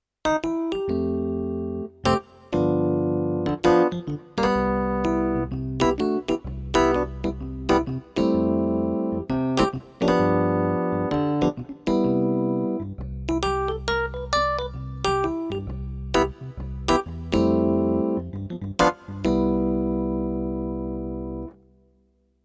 Korg Pa1000 Dyno E Piano

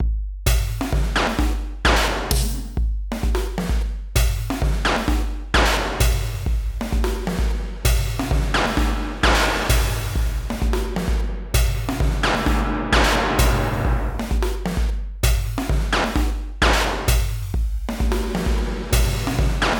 Drum Reverb; Erica Synths Sample

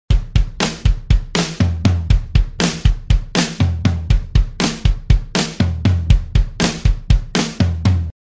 Bus Peak Acoustic Drums Smasher

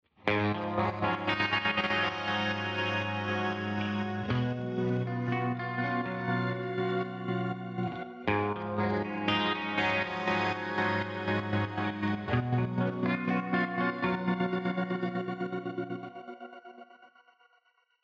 Riff sound

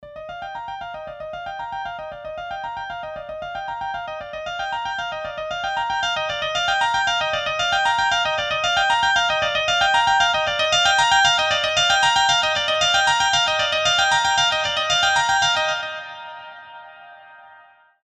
Arturia V Collection X CP-70 Arp

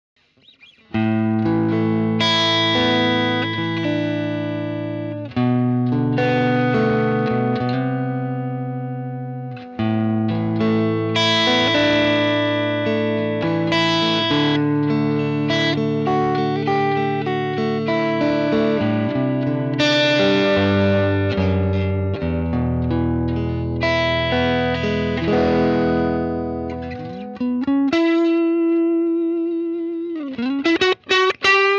JCM 1 Clean sort of Strat

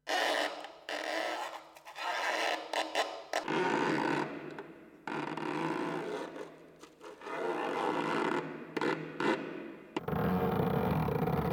Transposition Polysampling

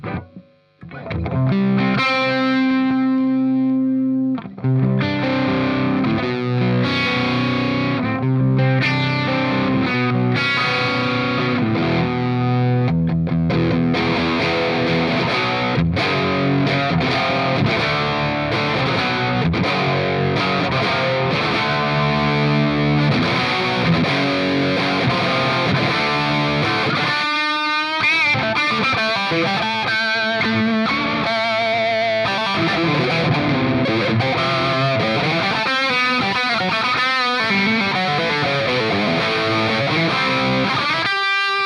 JCM Rock 4x12er Greenback ES335

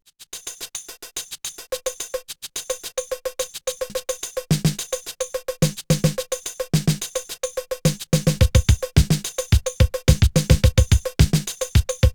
Drums Percussion; SoundForce S LD

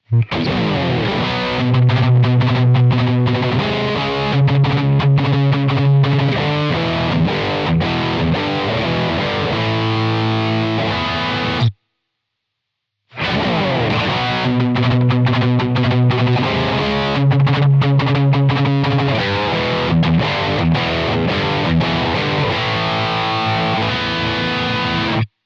Amp USA Twin High Gain; Simlpifier

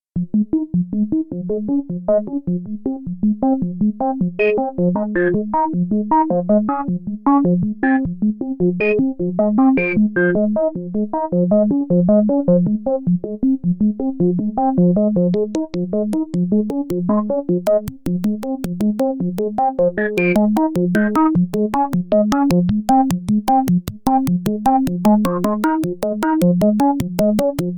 6 VCOs filter ENV

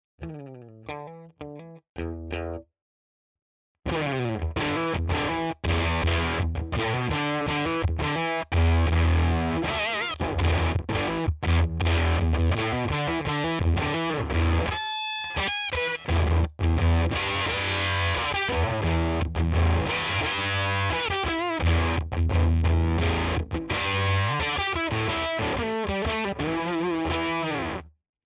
Riff; G full pre half honey

